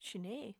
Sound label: sine